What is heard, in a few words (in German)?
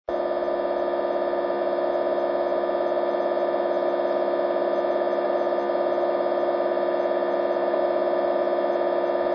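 tetra, Störsignale im 70cm Band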